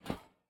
step, copper golem, oxidized; Minecraft

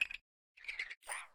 spin, copper golem weathered; Minecraft

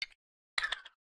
spin, copper golem, oxidized; Minecraft

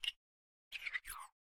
spin, coppergolem; Minecraft

